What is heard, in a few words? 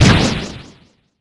Hit; Balloon